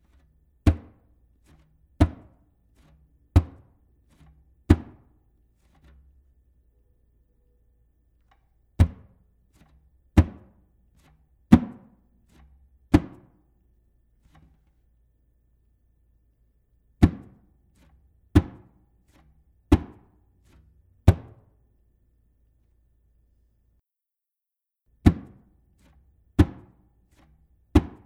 TAMBOR SURDO Toque com a mão sem baqueta

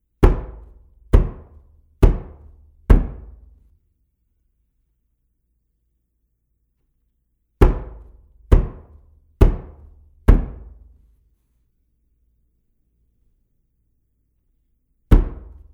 CAMISÃO GRANDE Toque preso

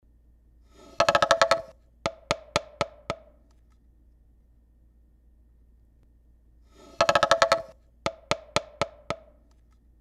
RECO RECO

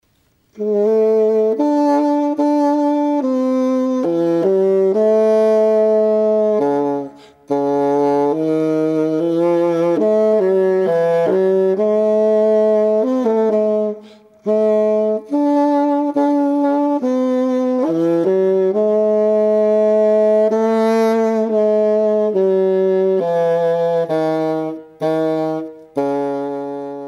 Fagote

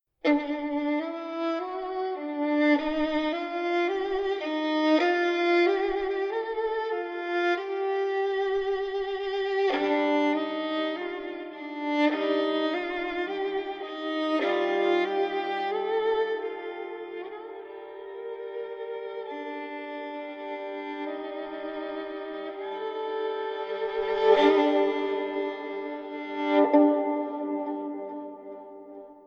Violino

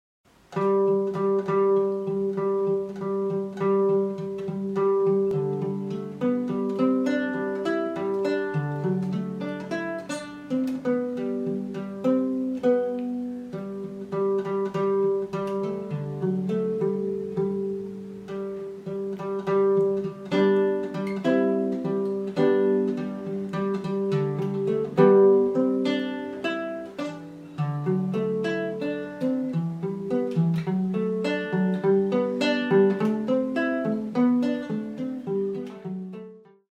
Viola de cocho

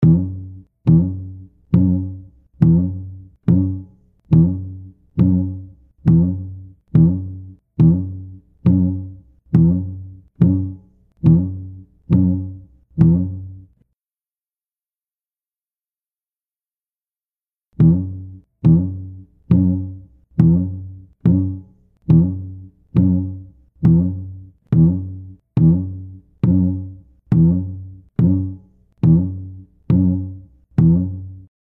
CAXAMBU E PUÍTA Juntos

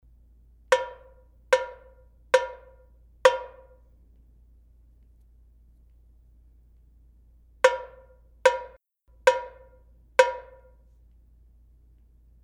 TAMBORIM DE SAMBA Toque solto

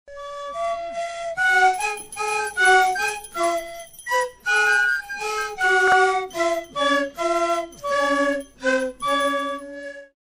Flauta Pa

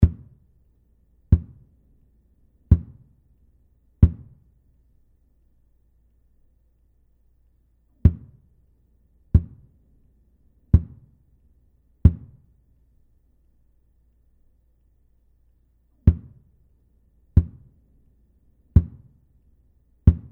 TAMBOR SURDO Toque preso

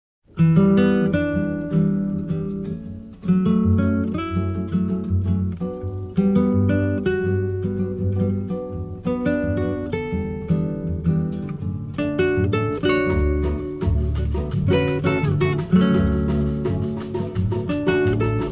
Violao

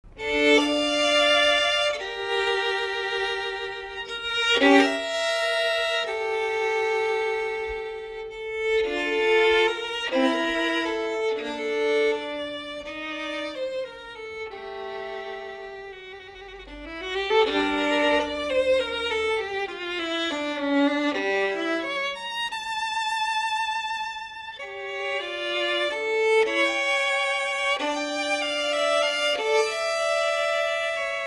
Violino

